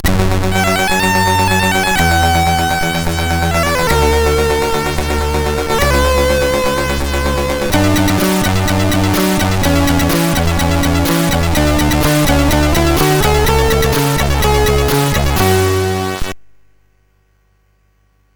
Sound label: Game Music, sid CSG8580R5